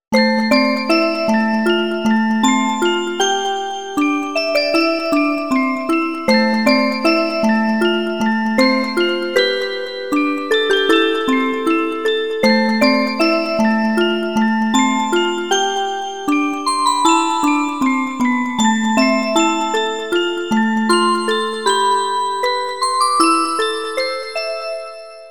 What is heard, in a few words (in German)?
Orgel